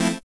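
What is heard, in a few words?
synth filter, dance synth; Sound & Vision - Gigapack I CD 2 (Roland)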